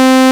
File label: saw sync, raw sync pads; Zero-G Ambient 1